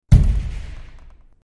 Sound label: Glass Door Knocks, Knocks On Glass; Light Knocking On Glass Door.